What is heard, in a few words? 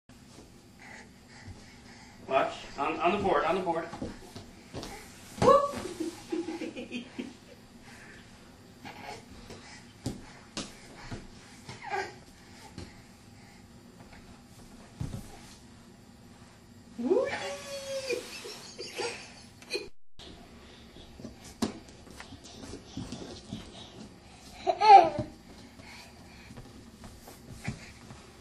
sliding; What I learned at Gymboree